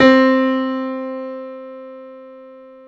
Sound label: PIA MICRO, MICRO-PIANO